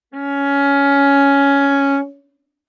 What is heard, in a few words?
Viola arco ff sul C Db4 stereo; MIS Pitches - 2014; Strings